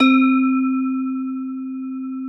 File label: Platter; ESW Sphere